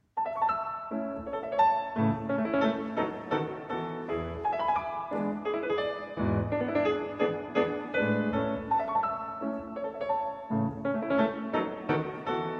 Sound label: Piano Sonata No. 2 in A Major - Scherzo – Allegretto